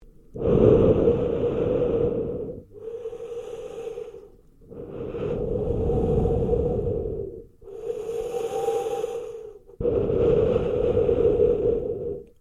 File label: MALE HEAVY BREATHING SEQUENCE COVERED LOOP